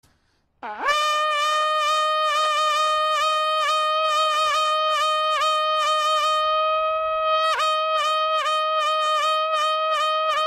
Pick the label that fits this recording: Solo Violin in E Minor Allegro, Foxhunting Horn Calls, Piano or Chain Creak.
Foxhunting Horn Calls